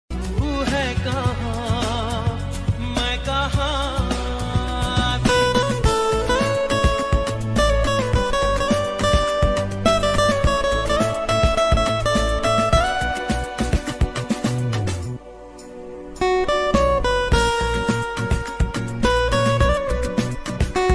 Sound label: instrumental ringtone